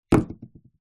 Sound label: household trophey set down wood shelf